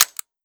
dry fire, weapons; arccw

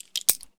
stilletto draw; arccw go

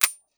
revolver hammer; arccw go

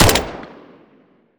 mac10; arccw go

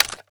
mp9 clip out; arccw go

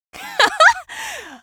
laugh

